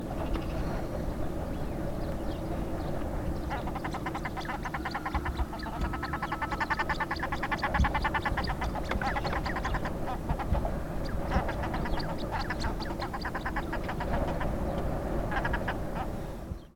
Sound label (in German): brandgans